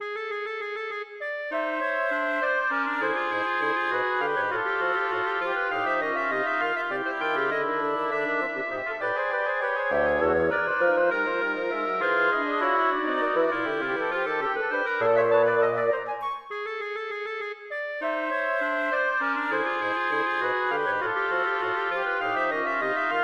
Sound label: quartet for winds; chamberbowling